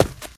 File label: concrete, footsteps, infected, walk